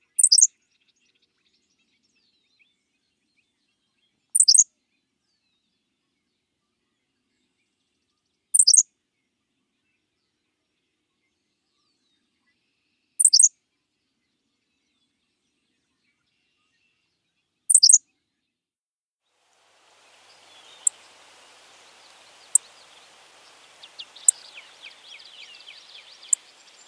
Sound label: Henslow's Sparrow